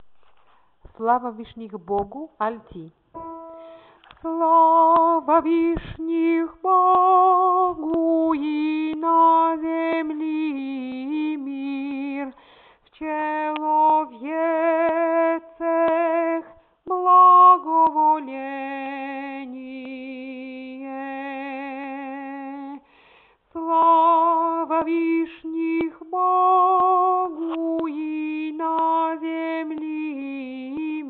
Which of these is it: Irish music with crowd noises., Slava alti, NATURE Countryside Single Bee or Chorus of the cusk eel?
Slava alti